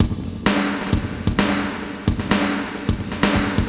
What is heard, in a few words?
Spring Verb HR-16B (130BPM), cyborg beats